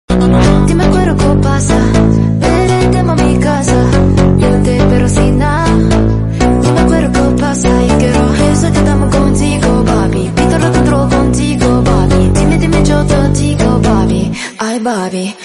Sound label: phonk music; cstrike